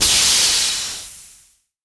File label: jumpmod boost